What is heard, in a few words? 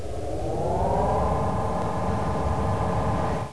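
turbolift; mrbo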